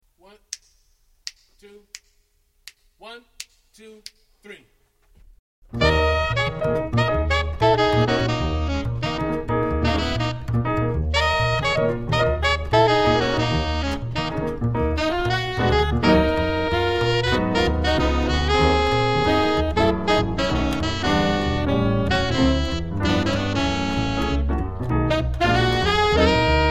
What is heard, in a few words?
minus drums; sticks throughout